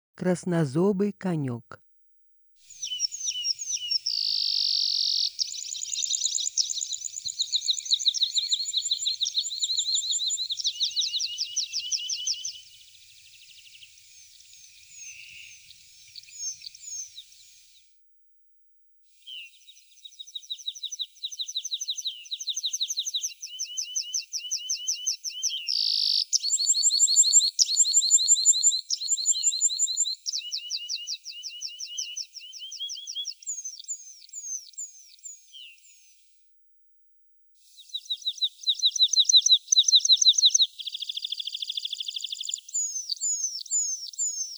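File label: red-throated pipit voice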